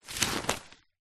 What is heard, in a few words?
foley paper sheets handful pick up grab